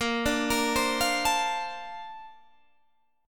Bb Major 9th, Bb

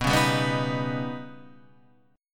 Eb Augmented 7th

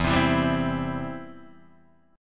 E Minor Sharp 5th Guitar Drop D; 2,3,2,0,1,0; down

